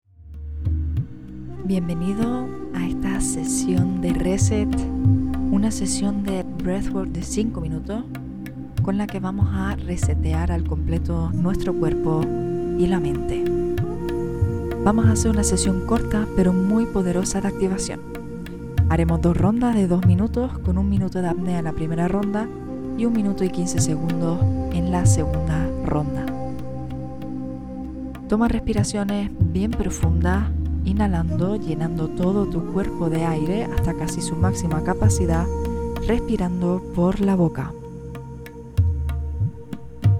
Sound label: breathwork break